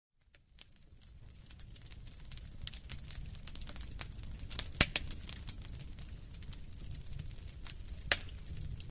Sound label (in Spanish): sonido fuego crepitar fire sound ruido de fuego sonido de fogata sonido fuego chimenea